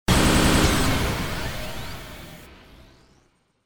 radio impact blast stutter; overte; partyBall

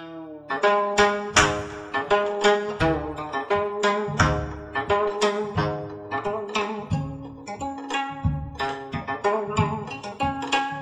gayageum; Korea; Instruments

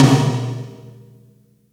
mid Tom; drumKit; overte; Marketplace

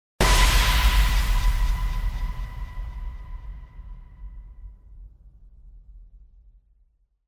cinematic tension impact; overte; partyBall

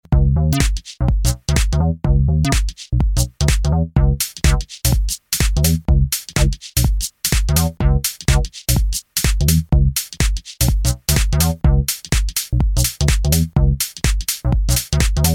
house, music; overte; partyBall

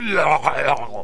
drown, player

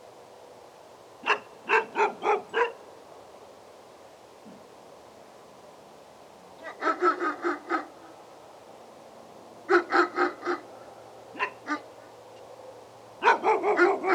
dogs barking; overte; Toybox

